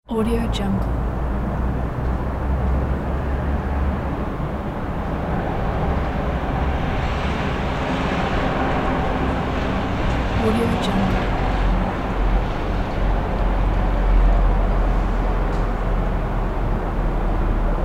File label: city noise